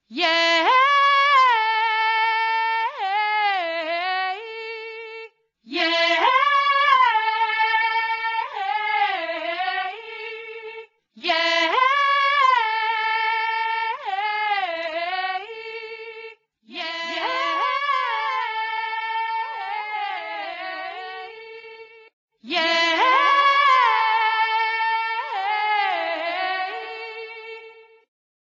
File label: vocals